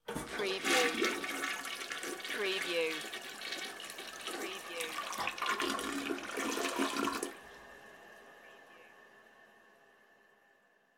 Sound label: Toilet Flush; sound effects bible